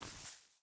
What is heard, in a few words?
footstep hide grass walk; Rust